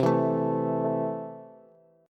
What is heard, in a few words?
C 6th C down Bass; C6 strummed